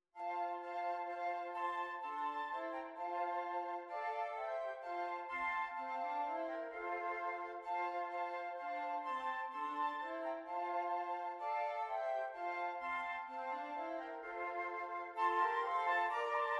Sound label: Flute, flute quartet; Angels we have heard on high; Carols for Four